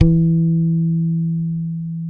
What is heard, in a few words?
SADWSKY MUTE, Bass; Sampleheads; Will Lee Bass Library; SADWSKY5MUTE